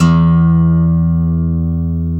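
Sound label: guitar acoustic; Sound & Vision; Gigapack I CD 2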